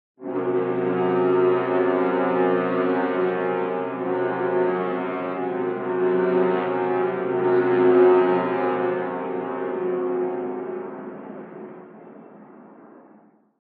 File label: Ship Horn 2 Lng Blast, boat horns